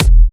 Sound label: Sub Kicks, Bassdrums